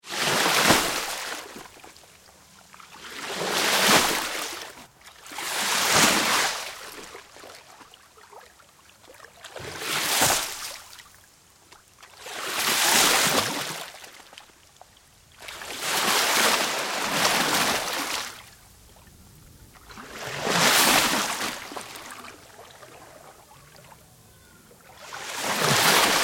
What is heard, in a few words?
Water Moves, Various water movements; Nature; Tom Hutchings